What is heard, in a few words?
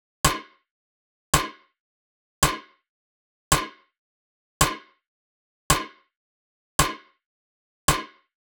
Drum Loop - 110 BPM Snare; Experimental Drum Loops; Loop Stems; Cymatics; Mystery Pack Vol 4